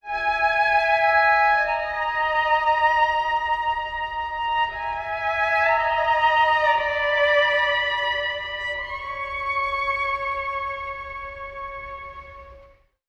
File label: strings, Sad Loops